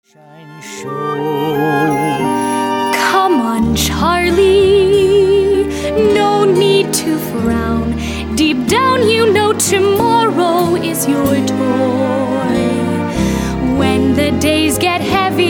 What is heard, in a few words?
Vocal; audition materials; Willy Wonka Jr